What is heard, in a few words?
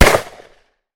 unload, weapon; weapon-hitman